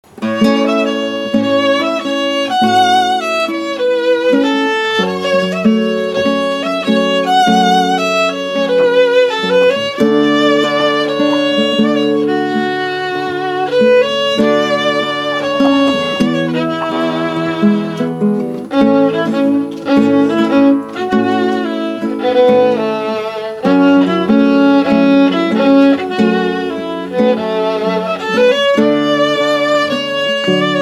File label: Guitar violin duo